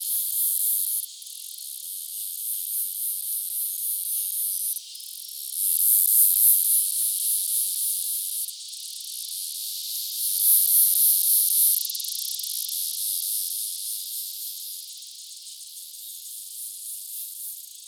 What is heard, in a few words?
Myopsalta longicauda; Geham